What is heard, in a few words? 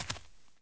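walk left heavy, dirt foot; The Elder Scrolls III Morrowind GOTY; XBOX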